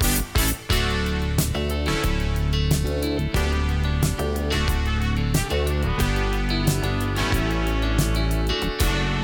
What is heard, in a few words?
background music; 3DO; Portfolio 2.5; Jumpstart; UFO